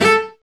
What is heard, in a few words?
STR Vc Attack; Roland L-CD702; Vc Marc&Harm